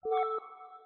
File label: action